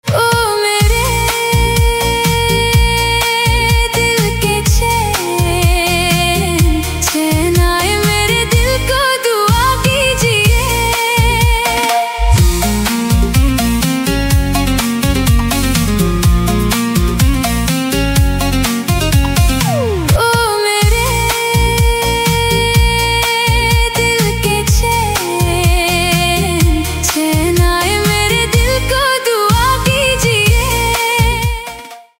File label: Female Version AI Ringtone